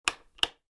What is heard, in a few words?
light switch